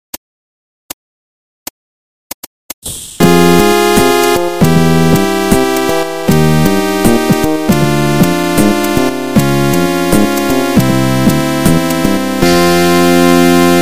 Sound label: Game Over; Studio Pixel; Cave Story OST